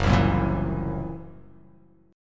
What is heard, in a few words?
Gb 7th Flat 5th Gb down; strummed; JGuitar